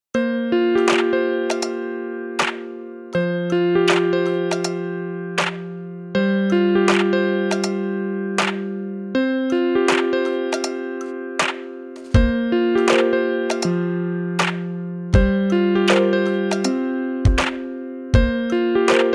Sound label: BGM